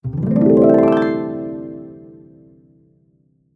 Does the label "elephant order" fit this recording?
No